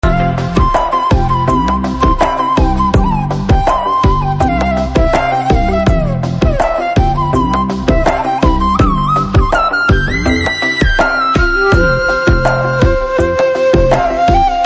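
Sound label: background music Telugu Ringtone; Rang De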